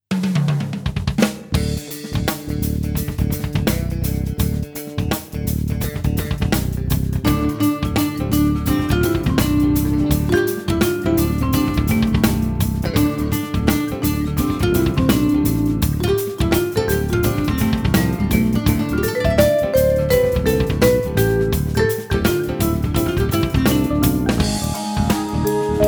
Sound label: Shuffle Double; DPA